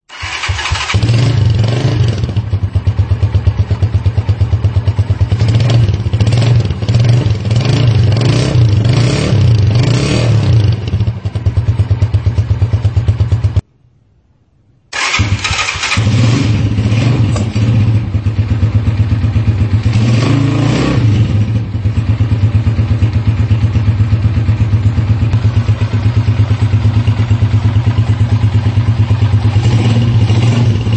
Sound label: Tizero exhaust